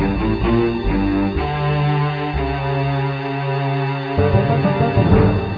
orch

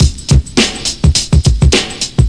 Drum Loop; Assassins 4; egg salad

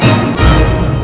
ORCH HIT; DeluxeMusic2

